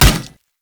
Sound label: Hammer Impact Armor Damage; Weapon Impacts; Player; Combat; Tuvalkane Hammer